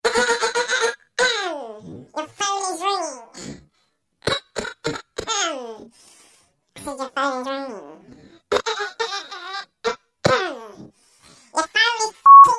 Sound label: Your Phone Ringing